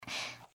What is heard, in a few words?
breath; pony; Twilight Sparkle